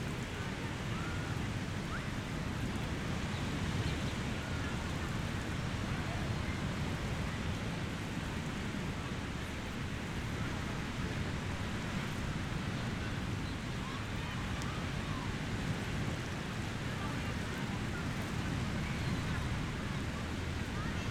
Distant calm waves, kids playing, birdsong; Sound Ex Machina

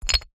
clay hard pieces bash against each other

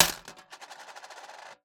Coin Drop in Tin Coins Spin

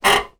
household radiator creak

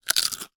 human bite potato chip crisp single

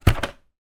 household vhs video cassette case open

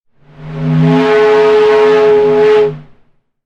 sound design effect air pipe pressure; sound effects one

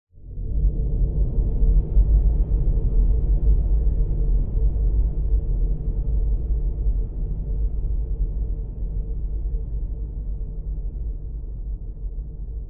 science fiction dark drone empty desolate fade

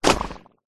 cow pat poop land ground

